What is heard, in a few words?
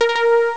echo pan; Amiga Soundtracker Sample Packs